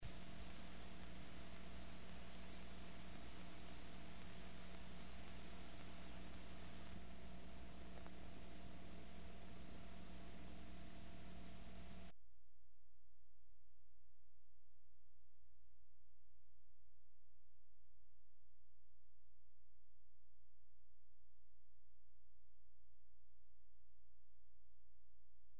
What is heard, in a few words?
line noise, Vinyl